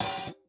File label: hat open close